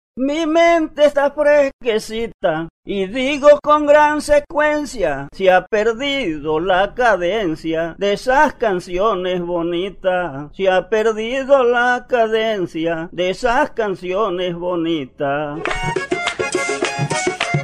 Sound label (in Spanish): el vallenato en piqueria; Musica Vallenata Tradicional